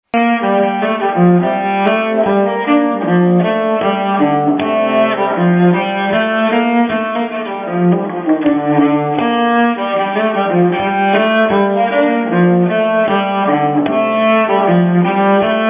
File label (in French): Falu Polska Rapide, nyckelharpa